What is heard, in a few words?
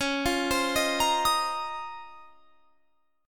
C sharp Major 13th, chord; C#M13; arpeggiated